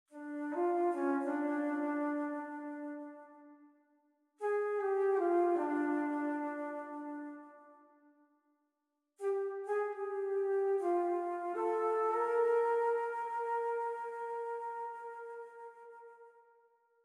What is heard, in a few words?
Lobby Alto Flute; Bunpou Kake Lobby Alto Flute 2